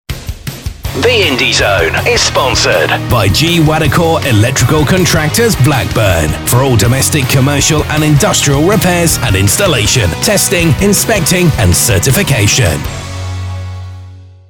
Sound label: indie zone rock, jingles